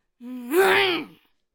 Attack Vocals; Swordcaster

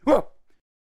Monkey King Attack Vocals

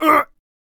Knight Death Vocals

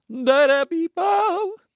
Present Elf Attack Vocals

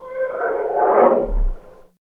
Mammoth Death Vocals

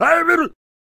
Attack Vocals

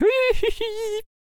Chariot Attack Vocals

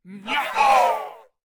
Dark Peasant Attack Vocals

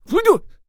Protector Attack Vocals

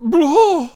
Healer Attack Vocals

